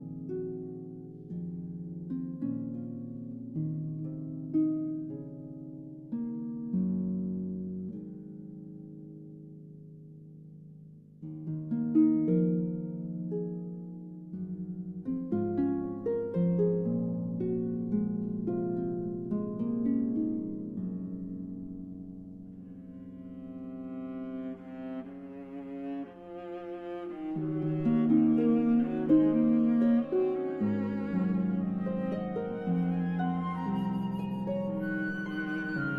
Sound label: Harp Concerto Lullaby